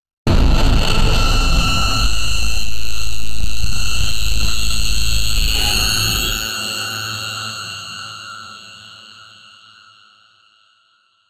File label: crytal like sound effect, aura